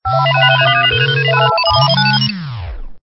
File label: Stinger; STINGERS